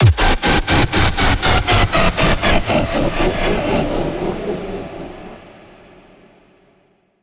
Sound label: Low Cinematic Impact